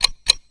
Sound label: tnt timer; dod; qorhall